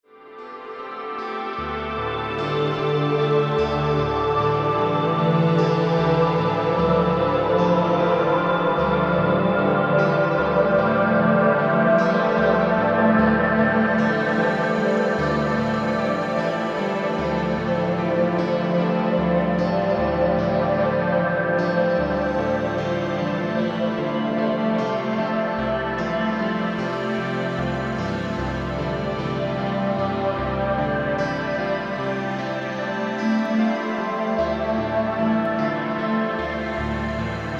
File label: Cascading Waves